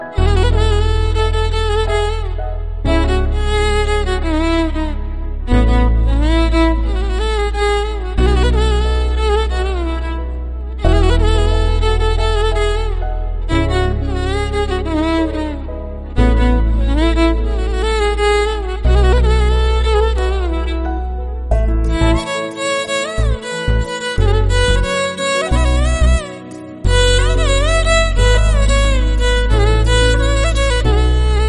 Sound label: INSTRUMENTAL SONG RINGTONE